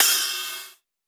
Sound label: Crash, cymbals; Crashes; Future Bass; Drums; One Shots